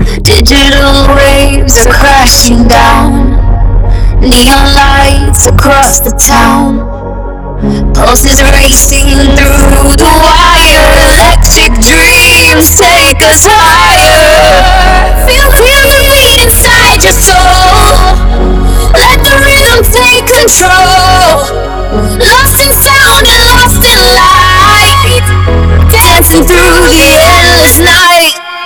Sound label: electronic amplified